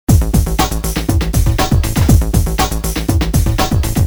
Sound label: Loop